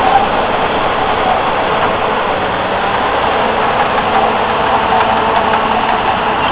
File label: bulldoz, Kiddie Ride